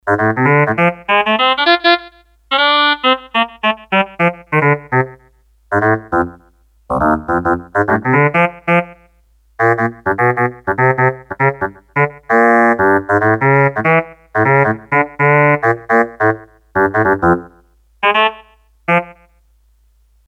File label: Sax, Korg DS-8; Factory Patches